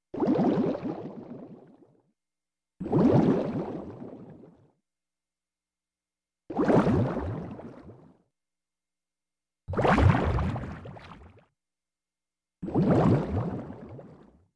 Bubbles Released At

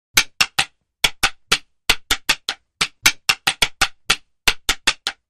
Stick Fighting Rout, spears & staffs

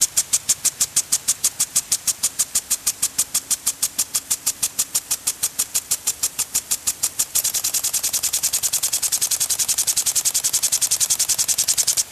Sprinkler Metal

